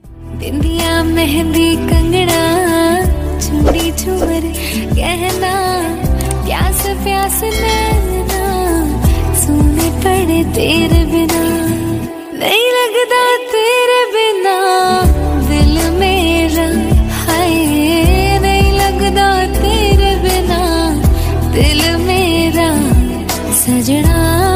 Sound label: sweet music ringtone, bollywood ringtones